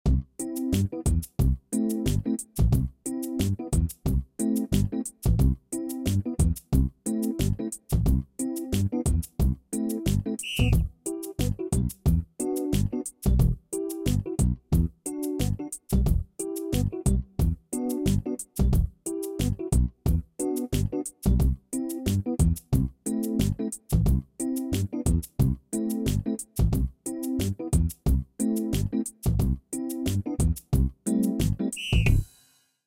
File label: main music; RS Games Sounds